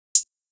Hihat

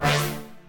Stabz

